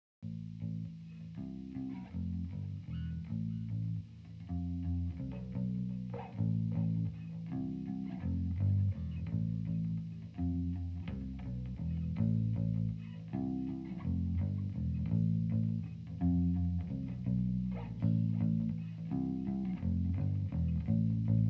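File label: M160 mono Bass (Guitar Amp)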